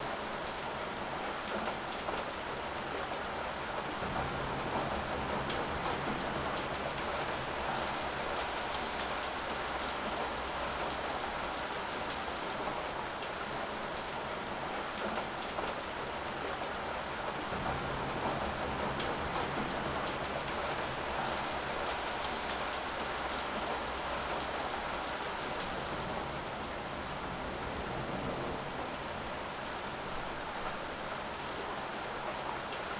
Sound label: RAIN LITE IN; SIMUZENSOUND; WEATHER SIMUMAK